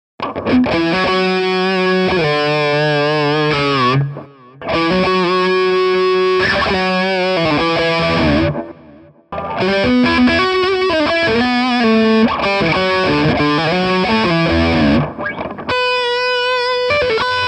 High Gain Solo

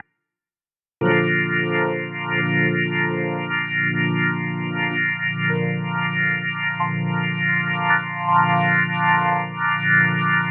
Kurzweil PC4 Organ

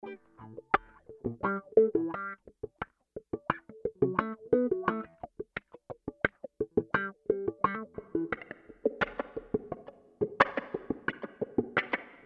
Lexicon Room Wah Wah

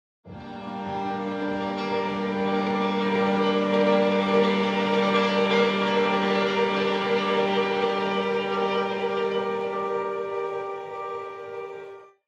Pad; ASCEND; Forever Struggle

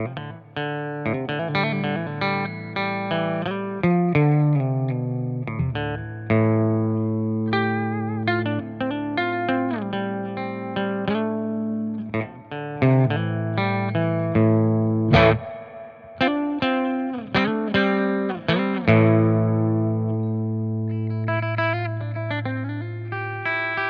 Neural DSP Quad Cortex Neural Capture Brit EL34

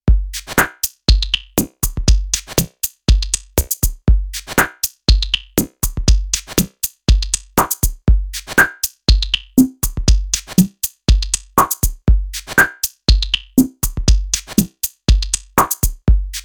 Loop Filter

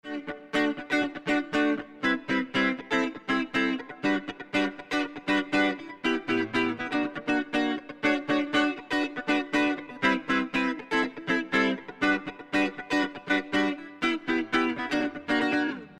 Guitar Rhythm 3Phase Mode; SRE330

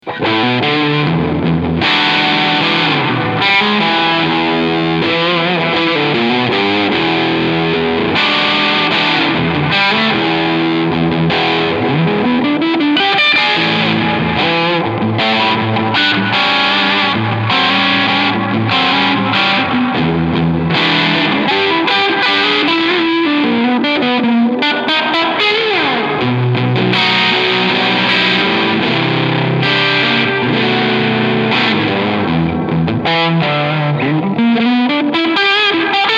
JHS Space Commander Booster Reverb

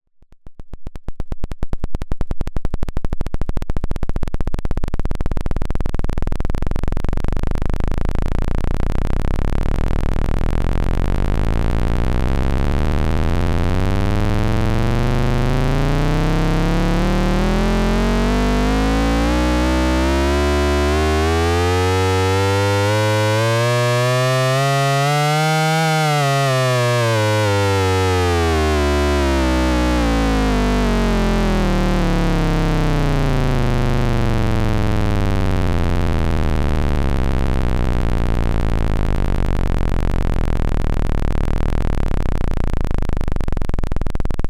Xaoc Devices Leibniz Binary Subsystem 8Bit LFO

